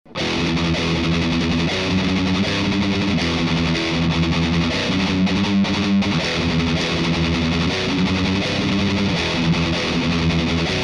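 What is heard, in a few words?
M1000 Rhythm, LTD M1000, Metal Rhythm; Langsames Solo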